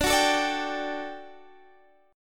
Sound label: D-Major Add 11th D; strummed; JGuitar